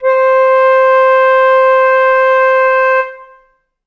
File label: flute acoustic